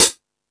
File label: High Hat, Drum Machines E-MU Pro-Cussion